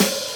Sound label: Hat; Touch Loops; ClassicBreakSessions